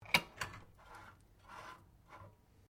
Can Opener Remove Lid; various metal cans; Metal (Sound Effects:Materials)

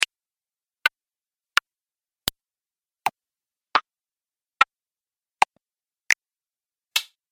Clicks; Object Sound Effects

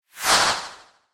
Laser Beam Pass By, Whoosh; Lasers; BlastWave

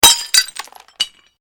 GLASS SHARD SMASH; Material components for constructing more complex sounds; Materials

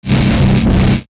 Distortion Pulse Swell Multiple; Blastwave

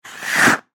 Ice Skates Blade, Scrape, Stop; Sports; Ice Skating; BlastWave

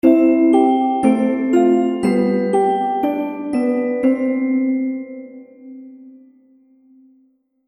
Happy Baby Game Music; Cartoon Music

